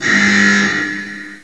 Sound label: clang, ambience; caged